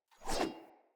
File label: Player Sphere Polearm Whoosh Fill Short; Weapons